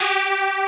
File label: synth